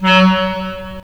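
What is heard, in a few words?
wind clarinet; Roland - LA Composer vol. 3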